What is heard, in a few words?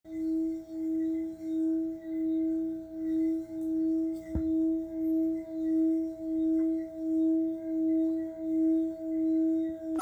Glass Singing Bowl Mallet w/ Silicon top; Embossed Buddha